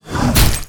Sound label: Ranger Attack